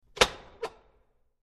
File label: Push Bar Door Open